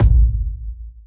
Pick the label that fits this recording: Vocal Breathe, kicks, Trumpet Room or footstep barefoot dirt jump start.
kicks